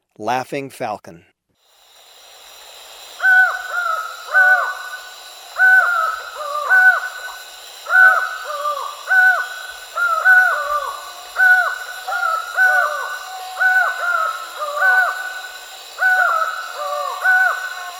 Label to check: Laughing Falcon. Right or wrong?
Right